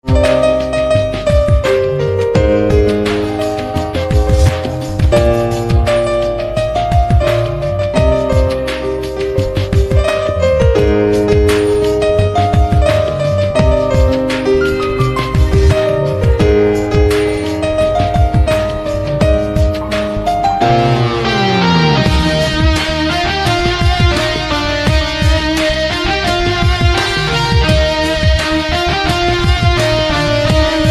Piano cum Guitar Version Ringtone

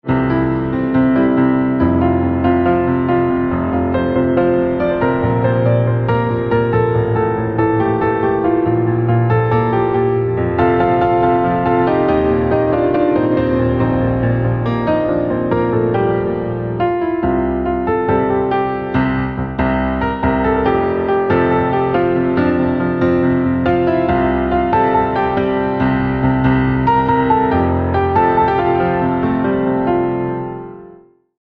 Piano Instrumental Ringtone

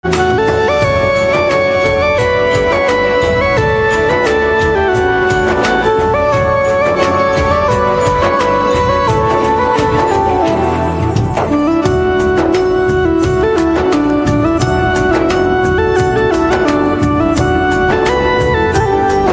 Organ Instrumental Ringtone

